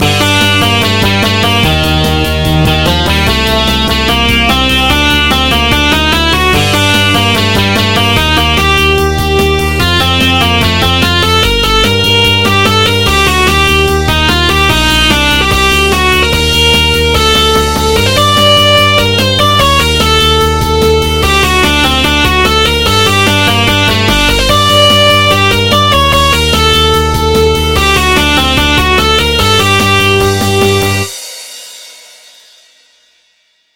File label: Music Test